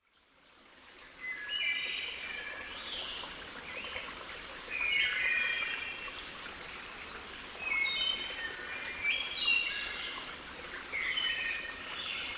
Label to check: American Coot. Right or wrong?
Wrong